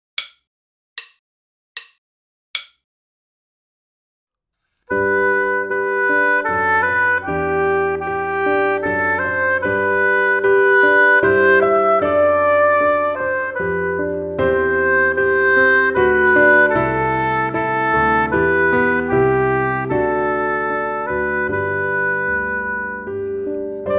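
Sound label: cantilena, hautbois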